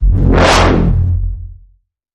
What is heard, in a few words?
Bend The Bassline F